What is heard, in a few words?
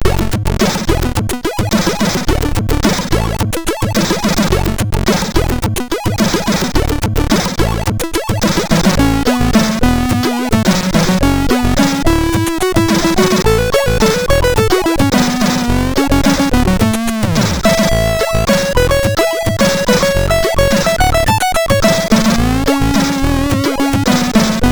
sid MOS6581R4